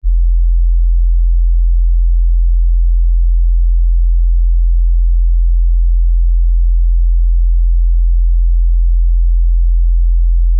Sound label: Sinus 43 Hz Low Bass